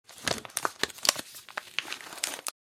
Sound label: Food packaging unwrap ingredients open; paper